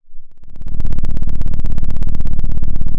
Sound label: pop, Sound of an engine revving